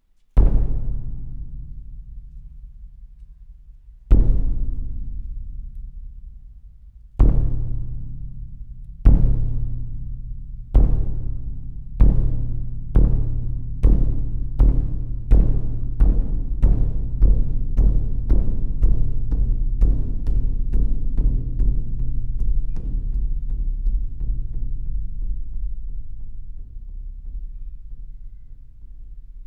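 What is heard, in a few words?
big drums; Большой японский барабан.; Tестовые сигналы и звуки.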